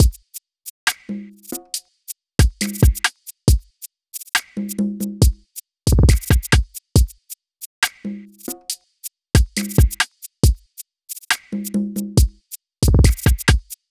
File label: Afrodrill full drums loops 138BPM; Afroplug; virgule